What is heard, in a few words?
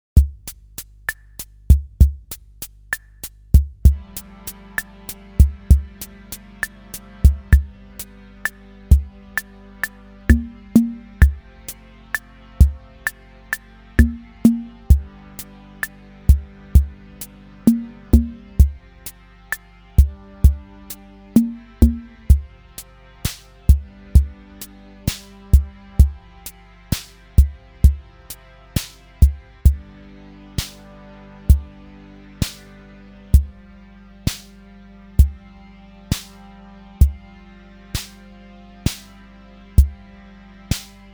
Mini Drums Pattern